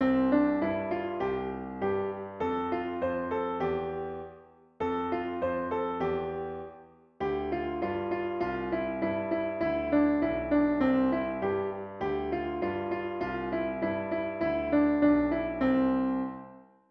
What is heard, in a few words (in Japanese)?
ピアノ